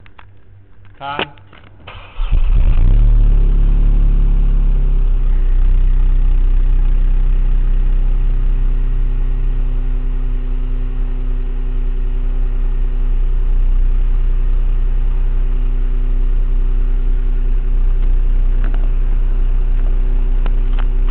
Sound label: corsa c